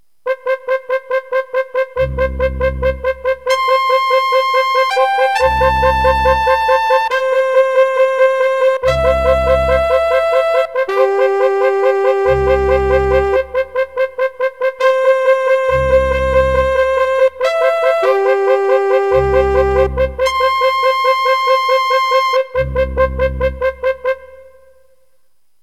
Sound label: Roland MT-32; SimFarm; Microsoft Windows Version; Alien